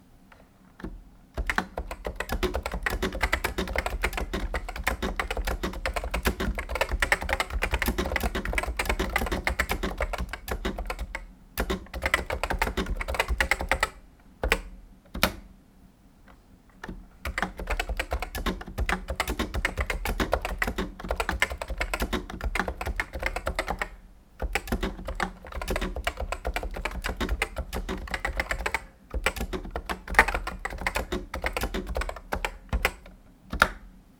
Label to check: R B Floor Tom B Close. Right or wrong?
Wrong